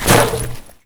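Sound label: jump slate; jump sounds; STALKER; IWP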